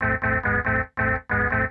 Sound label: TECHNO SYNTH 140; Keys